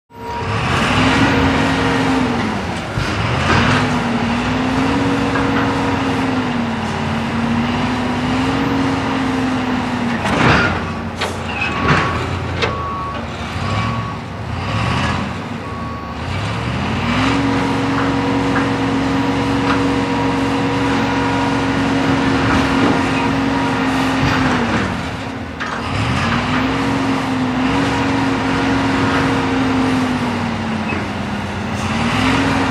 Warehouse Background 1; Warehouse Ambience; Large Motor / Lifting Noises, Backup Beeps, Medium Perspective